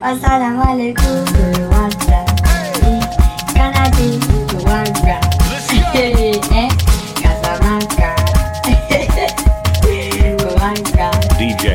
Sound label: Beat; A-talented Nigerian beat maker